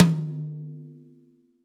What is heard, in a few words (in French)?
Tom alto